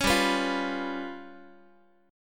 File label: G 7th Flat 5th, Guitar; G7b5 strummed